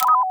Item Deselected Negative Beep

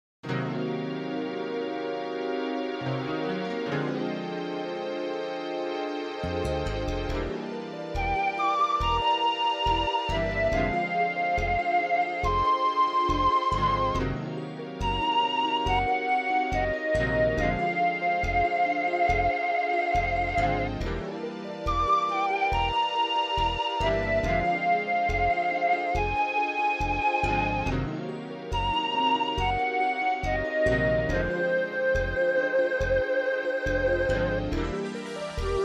MIDI Dungeon

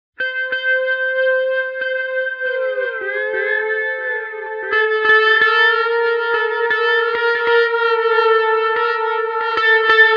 G steel

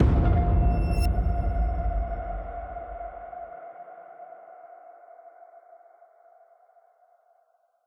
Fight Fight Start

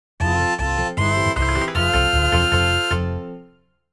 Horse Race Goal; the legend of zelda ocarina of time 3d 3ds gamerip 2011

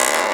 Creak; kids park kaitou sentai lupinranger vs keisatsu sentai patoranger; Hawksmom

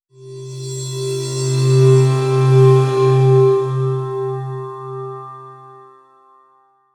Bowed Cymbal; Quern Undying Thoughts

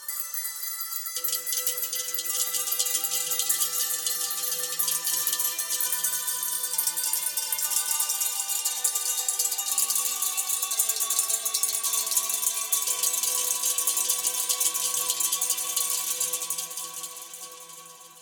Forest Dulcimer

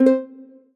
Success Guitar Fast Two Note Dry stereo; Obscure: Challenge Your Mind

